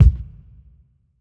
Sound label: tight kick one shot B key